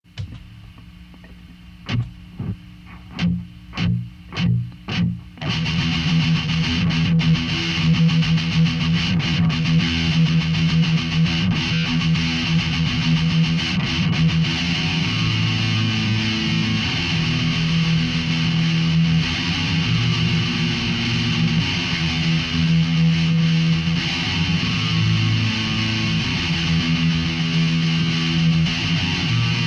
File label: Riff Tapes